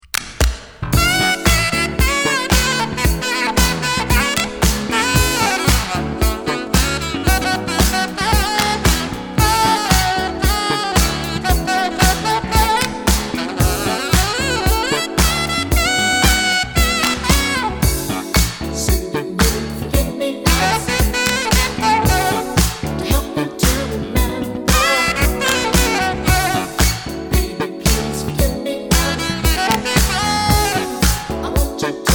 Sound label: 80s new reverb